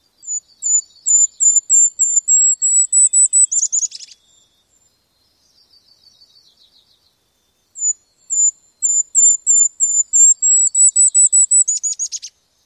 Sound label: song; NY